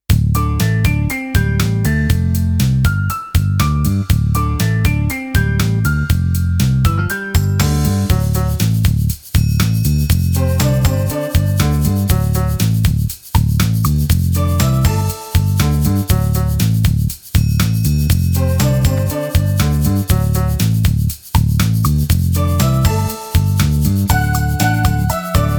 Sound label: instrumental